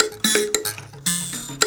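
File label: PLK Bass Mbira; Roland LCDP11 Africa VOL-1; M'biras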